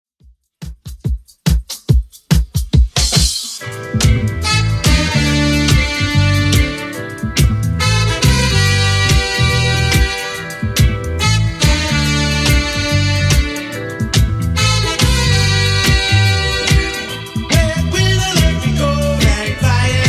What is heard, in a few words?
80s Redrum 142bpm